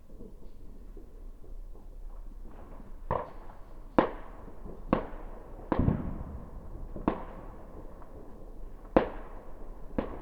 ilotulitus kaarina

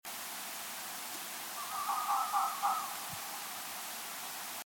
kettu; mke300

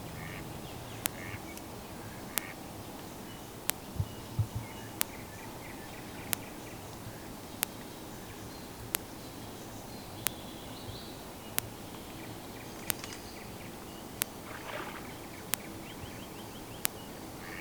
sahkopaimen

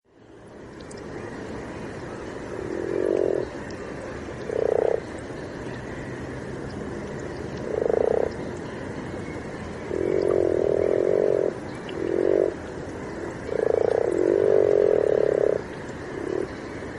sammakko

